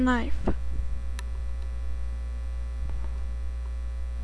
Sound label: knife